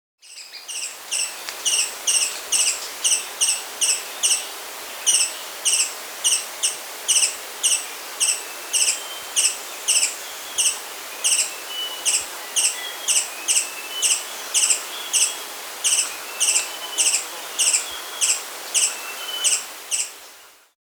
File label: Booted Racquettail (Ocreatus Underwoodii), Andean Birds; S. America